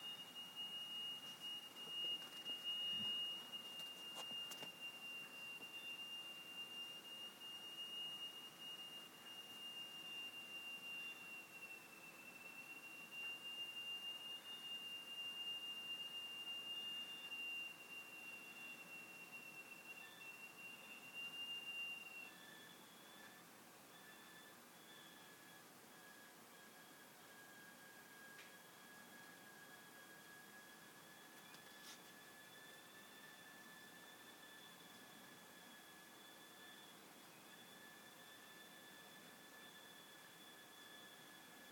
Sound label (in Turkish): kis gecesinde pencereden sizan ruzgar sesi